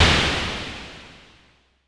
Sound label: Drum X FX, Pearl